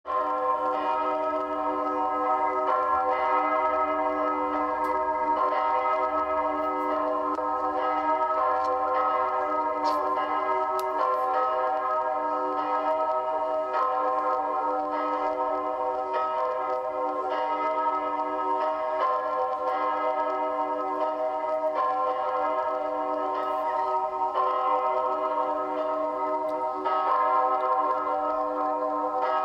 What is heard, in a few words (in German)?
Petrikirche Glocken Ostern